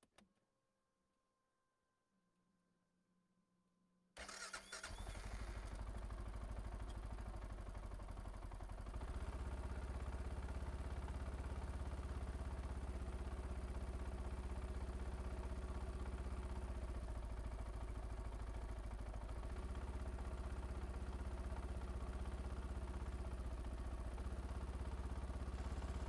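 textron alterra mudpro 700 onboard steady rpms start drive engine right; dpa4061; Sonniss GDC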